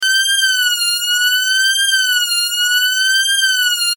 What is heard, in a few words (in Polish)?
ton